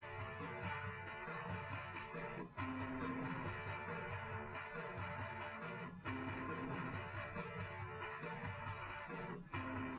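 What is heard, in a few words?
test with drums live rec; SiSEC11; underdetermined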